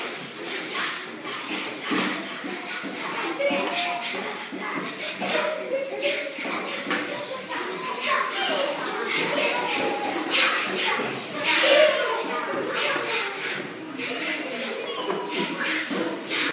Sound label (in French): batterie